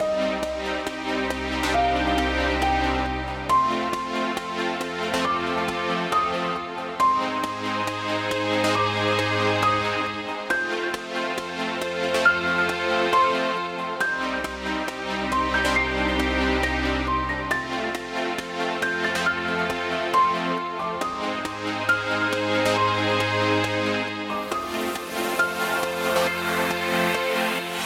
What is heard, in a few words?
Melody test